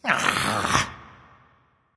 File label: "ugh"; smite; voicepack; ullr